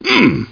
grunt

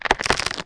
enemy lose; Gold Miner Joe

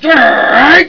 STRIKE

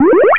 SUCCES, JINGLES; MOBICLIC41

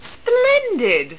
BONUS

